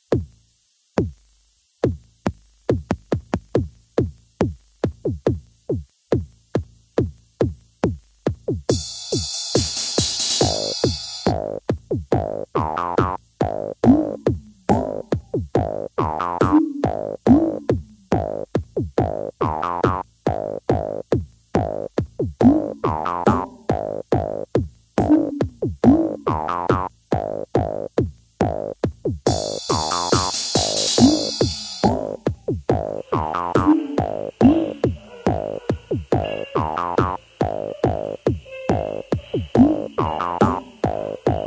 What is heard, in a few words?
Downtempo trip-hop